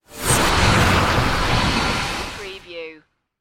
Magical Water Ascend Mysterious

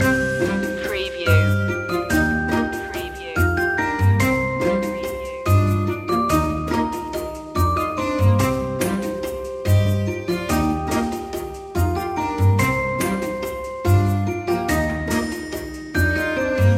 curious machine loop

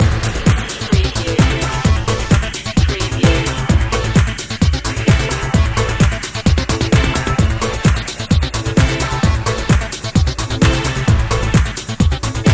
thrillseeker loop

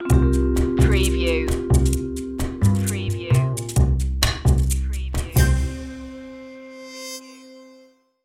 jazzy opener

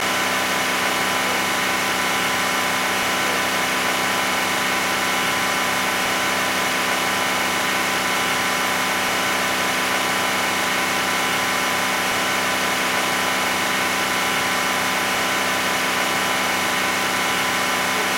Car Mercury Sable High Rpm Loop

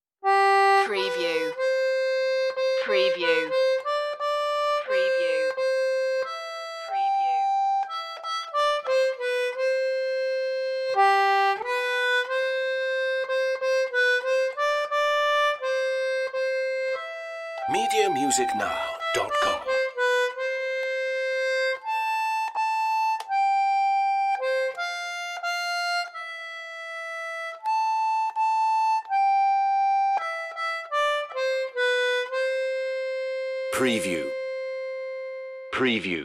solo concertina

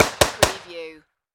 Submachine Gun Sterling

